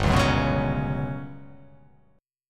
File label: G Major 7th Flat 5th